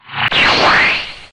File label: Skill Ultimate Energy Strike